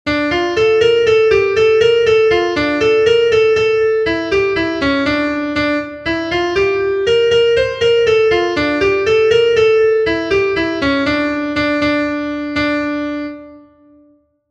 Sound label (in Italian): Tarantella, pianoforte